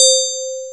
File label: BELL